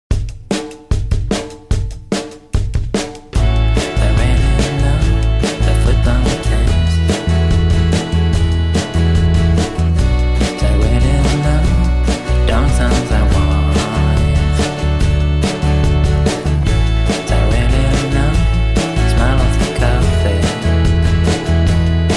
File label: electrophon vintage